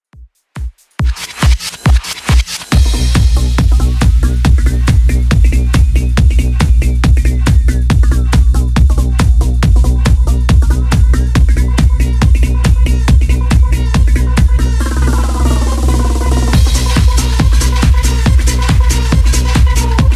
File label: 90s, 139bpm